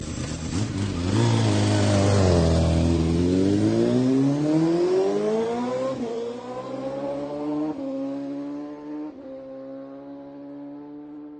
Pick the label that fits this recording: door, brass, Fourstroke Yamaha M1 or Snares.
Fourstroke Yamaha M1